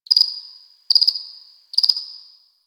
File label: Cricket